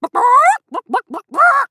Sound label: Chicken; Hunter; RealmOps; Realm Royale